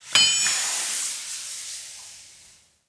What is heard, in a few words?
weapon factory; Immortal